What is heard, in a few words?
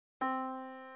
Yamaha Disk, piano sound